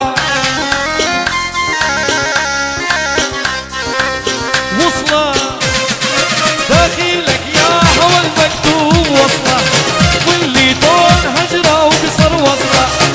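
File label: iraqi remix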